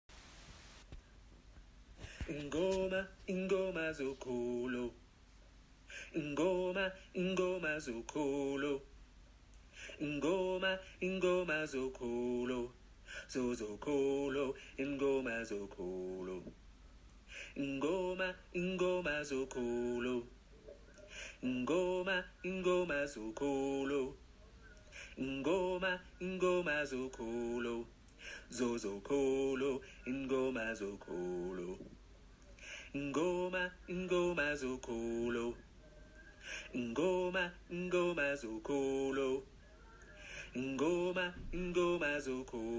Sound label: Soprano, gospel choir; INGOMA